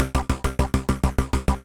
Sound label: Downhill Paragon, Wavetable, Synth Rhythmic; Instruments; Ableton; Factory Packs; Synth Essentials